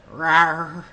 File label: roar